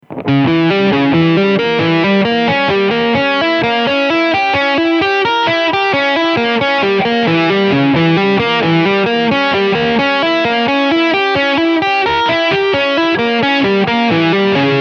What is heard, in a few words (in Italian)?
Reb dim